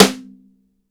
MIX SN RIM, DRY STANDARD SNARE

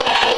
CHAINGUARD; Rarefaction; Poke in The Ear With A Sharp Stick

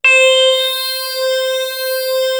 WAVEROL, KORG; East West - Global Sounds; WS KORG#2

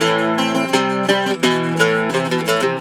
Celtic Flavours; USB Soundscan vol.42; AKAI

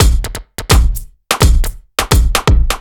Kit Heavy Swing, 85bpm; off the grid

